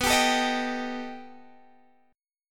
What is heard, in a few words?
B Augmented 7th B down; B+7; strummed